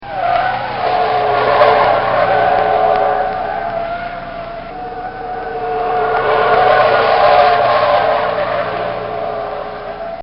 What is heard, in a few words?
Bmw Skidding; Cars (Sound Effects:Vehicles)